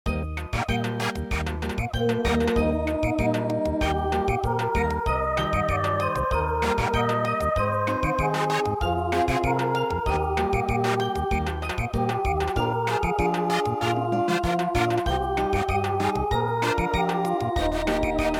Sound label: Samba; es freit ein wilder wasserman